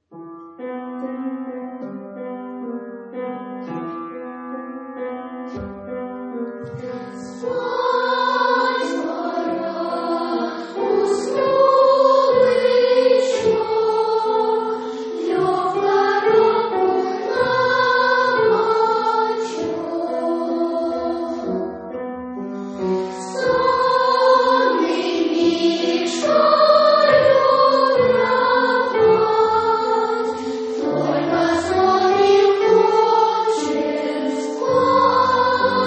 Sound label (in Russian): Хор первого класса; А. Степанов, А. Барто; Слон